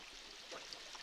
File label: Background Noise Clips